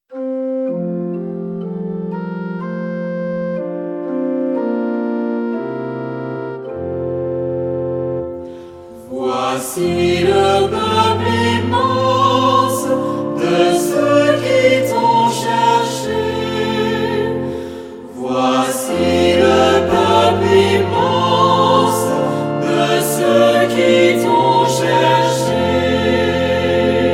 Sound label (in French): Psaume, Voici le peuple immense de ceux qui t'ont cherché; Ps. 023; Toussaint ABC; M. Wackenheim